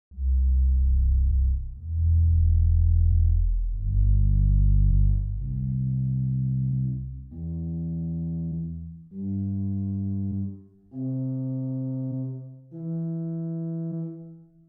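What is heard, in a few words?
Pedal Open Diapason 16 arpeggio, organ; St Annes Moseley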